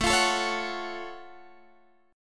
Gb Minor Major 7th A

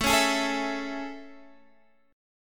A Major 7th A, Guitar, Standard; AM7 strummed; x,12,11,13,10,12; JGuitar

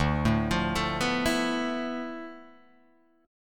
D Minor 6th Add 9th D, Guitar, Open D

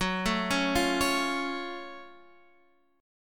F sharp Minor Major 7th, Guitar Standard; arpeggiated; x,9,7,6,6,9; down

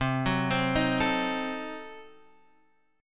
C Diminished 7th down Guitar Half Step Down

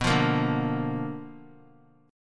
B Minor Major 7th Flat 5th Guitar; strummed; JGuitar

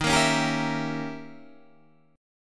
Eb 7th Flat 9th Eb, Guitar Half Step Down; chord

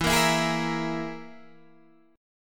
E Major Flat 5th E down Guitar DADGAD

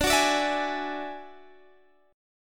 F sharp 7th Suspended 2nd Sharp 5th, D

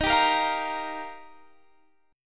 Eb Major Add 9th, Guitar Standard; Ebadd9 strummed; x,18,17,15,18,18; JGuitar

